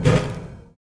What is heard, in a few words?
ladder, footsteps